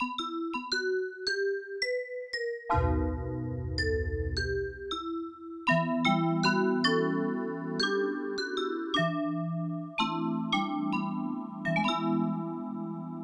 Vibes; Yamaha TX16W; Jazz Performance; Jazz Performance 2

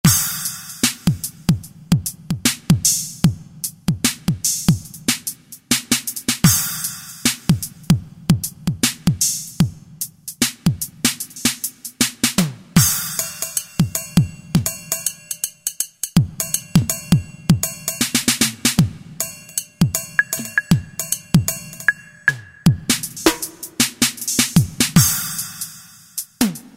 ELEC DRUMS; Roland SN-U110-10

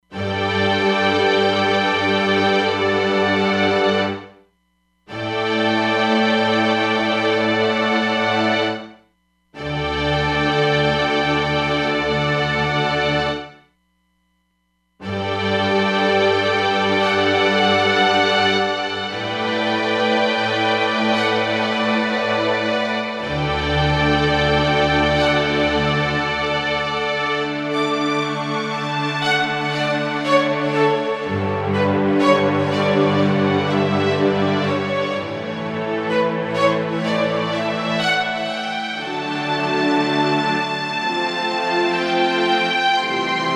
Predelay Long Hall, Roland DEP-5; Factory Patches

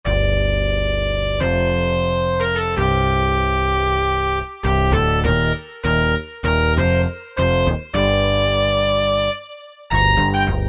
Bass-Pet, Korg MSC-1S Variety Combinations

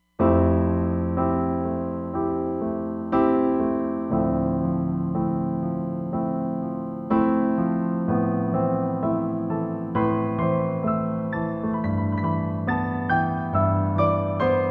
Hall Concert, Roland Fantom XR

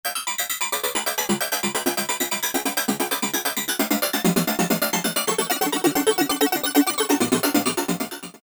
Krazy Arpy, Korg MS2000; Factory Patches

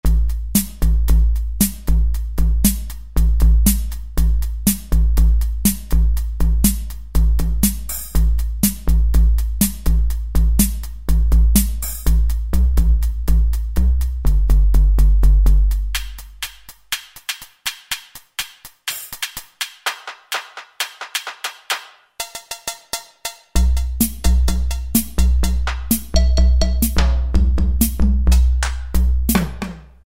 Beat Box, Kurzweil K2000; Kurzweil K2000 V3

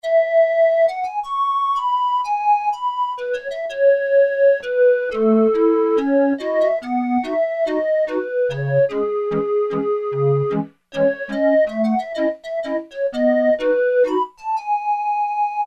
Calliope, Roland MKS-70

